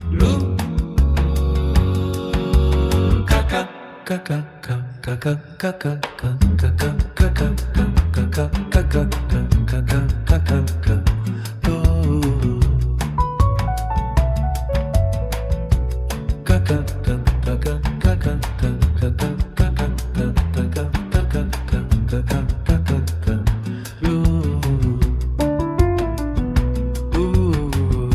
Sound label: bossa nova